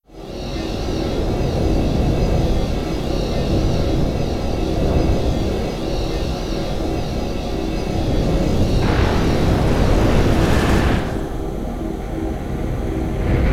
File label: Ending (SFX)